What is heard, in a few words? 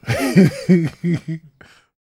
PREACHIN, laughs, jazz